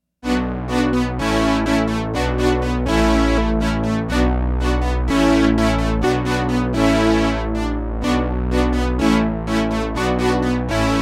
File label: OBXA CELLOS